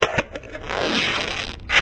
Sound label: bandage